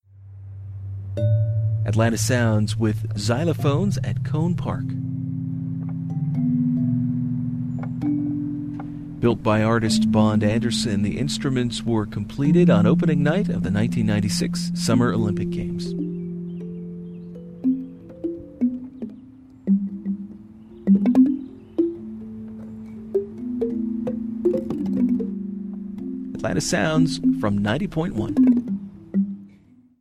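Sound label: Xylophones, atlanta sounds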